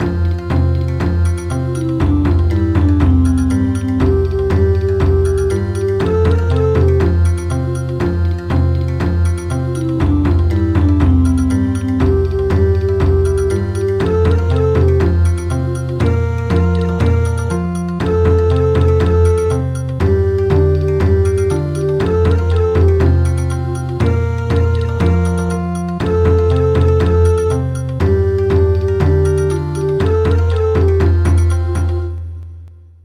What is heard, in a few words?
Bon Odori; Chan Mali Chan